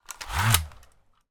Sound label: toy helicopter pull cord on launcher and launch